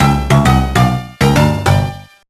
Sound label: Lose a Race; Tak The Great Juju Challenge; GBA